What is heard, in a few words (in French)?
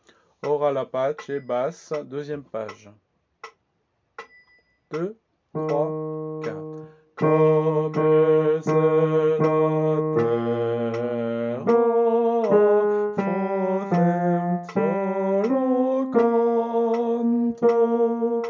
basse; ora la pace